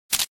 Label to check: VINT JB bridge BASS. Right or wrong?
Wrong